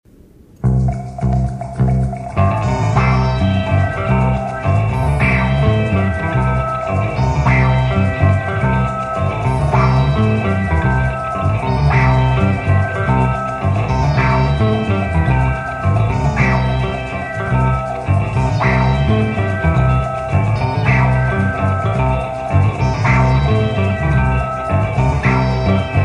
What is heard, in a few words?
Synth Vamp; Match Game; Think Cue 3; The "Synth Vamp" Think Music.